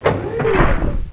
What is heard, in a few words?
Rearm Big; XWing